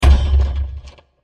Bursting Metal Impact; Bursts; Impacts; Crafted Signals

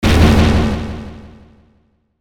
Hit Static Delay, Static Fuzz Dark Reverb Wash; Production Element Imaging Element Accent Transition; Blastwave

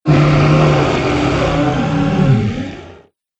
Fire Demon Roar, Monster; horror; Balrog; Monster Sound Effects

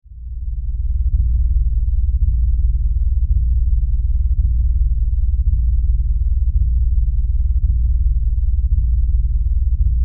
Science Fiction Power Drone Steady; Sound Design

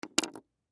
Pen Set Down Table; Office; Blastwave

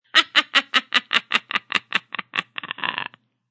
Witch Cackle; Evil Laughs; Horror

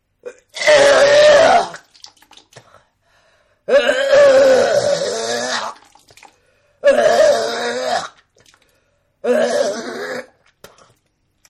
Vomit Dry Heaves; Vomiting; Human

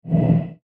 Dungeon Secret Door Revealed

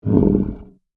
Monster Groan, Horror, Monster, Groan, Creature

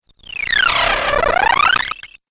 Game Glitch Effect, Video Game Sound Effects

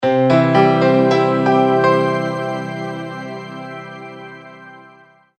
multimedia logo, Musical Identity/Signature

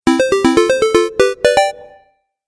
Retro Game Melody; Video Game Sound Effects

